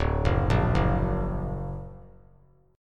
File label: D Augmented D down; D+ arpeggiated; JGuitar